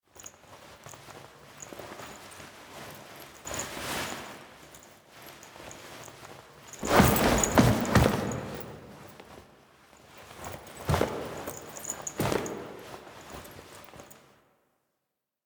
Foley C; Drifter; Warframe; Quests; The New War; Lotus Gem Part One

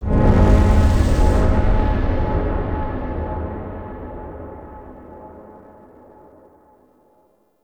Pillar Start Alarm; Encounters

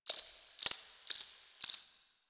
Cricket

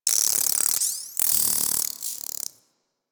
Robo Fish Small Caught

